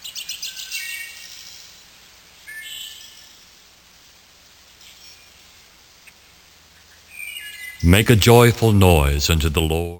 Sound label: joyful